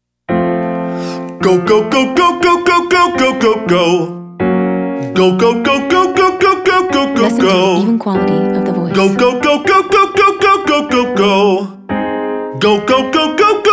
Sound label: Exercise 4 go go males; singing lesson